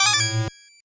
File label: 8bit se